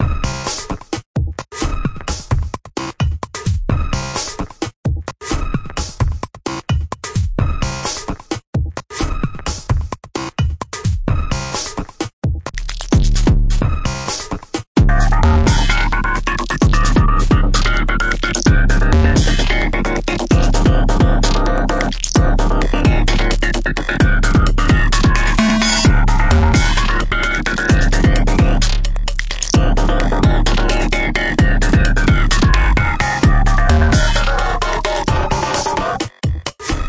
stuttery sample mahem

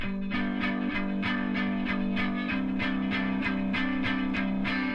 guitar

